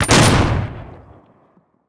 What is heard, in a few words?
shotgun dbl fire; doom; npc